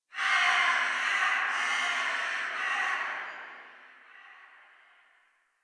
Tree Distant Critters Scared, Ambience

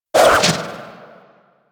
Auto Crossbow Tail; Warframe; Tenno

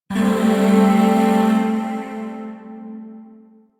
Shawzin Maj Chord K; Music; Chords; Warframe; Zariman Void Shawzin; DE

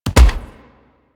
Weapon Punch Bow; Warframe; Weapon Punch Layers

